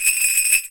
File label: SUZU, JAPAN PERC; AKAI S6000 CD-ROM; WorldSounds